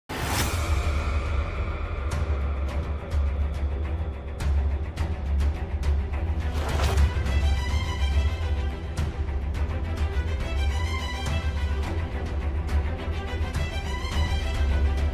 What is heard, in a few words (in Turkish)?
Enstrümantal fon müzik